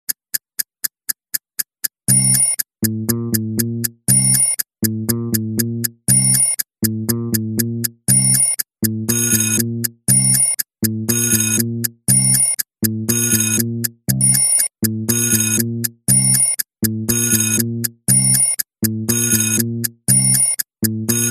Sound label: tick tock clock sound effects